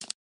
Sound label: Flashlight Click Mini; Lethal Company